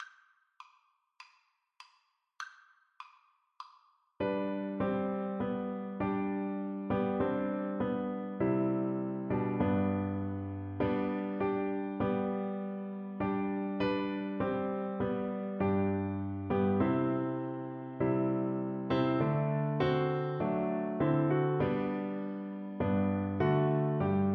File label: girl left, recorder